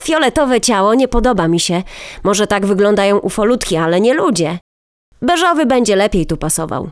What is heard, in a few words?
fiol; wesole przedszkole bolka i lolka